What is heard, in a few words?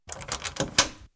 keylock; CLANNAD FULL VOICE